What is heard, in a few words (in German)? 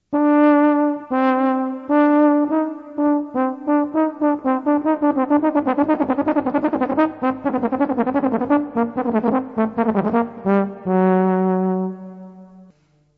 tenorhorn